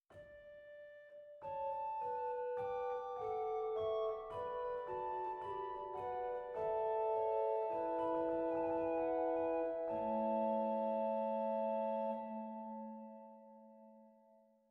Borstwerk Roerfluit 4, zwolle

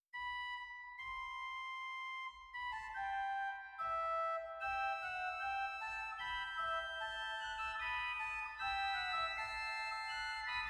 Rugwerk Superoctaav 2; zwolle

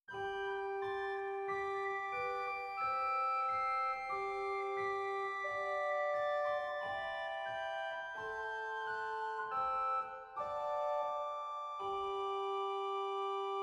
Bovenpositief Woudfluit 2; zwolle

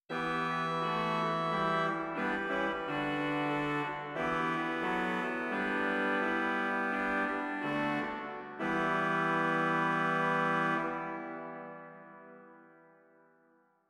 Rugwerk Schalmey 8; zwolle

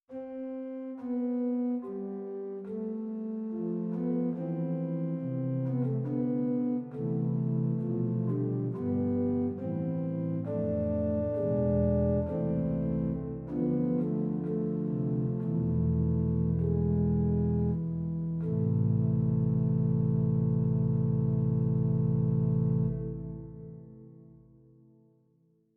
Praestant 16; zwolle

